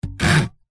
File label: industrial hand saw sawing wood hollow single cut score